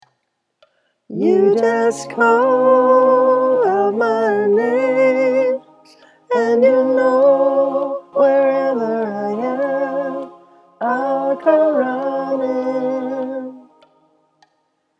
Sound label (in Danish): TENOR